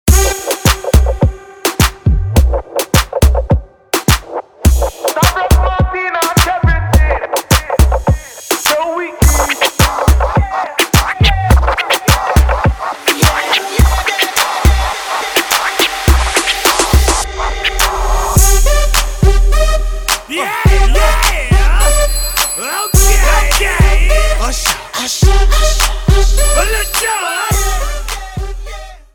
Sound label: REGGAETON VS HIP HOP INTRO BPM 105